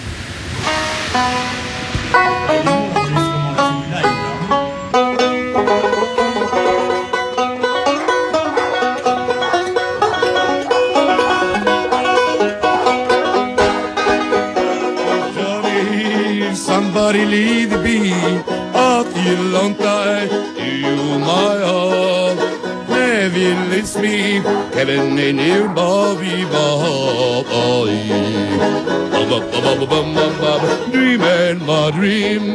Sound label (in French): Chanson et banjo